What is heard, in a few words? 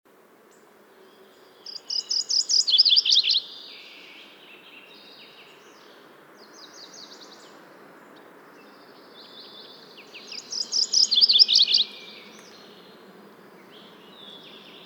MacGillivrays Warbler, Geothlypis tolmiei; warblers